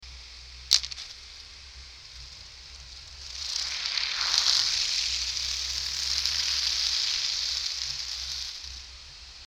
Speed Boat Leaving Pod

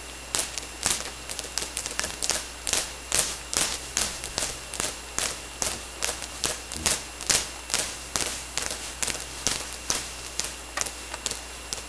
Click, Sea Sounds

